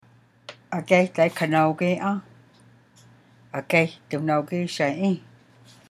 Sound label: Singing Sang AA